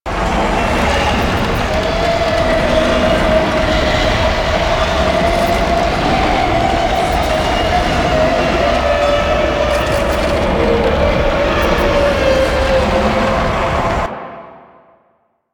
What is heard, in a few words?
Tank Infested Heal Lure Loop; Enemies; Abilities; Warframe; Lasrian